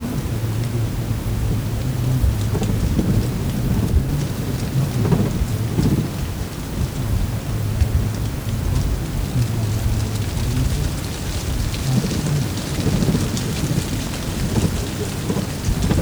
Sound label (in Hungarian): barlang néhányuk halk beszéd, denevérek; repules; termeszetben